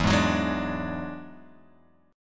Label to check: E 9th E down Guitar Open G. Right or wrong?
Right